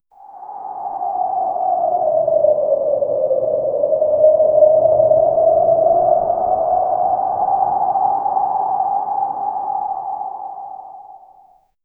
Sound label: clean modern transition